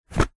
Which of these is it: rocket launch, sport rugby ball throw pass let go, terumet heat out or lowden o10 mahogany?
sport rugby ball throw pass let go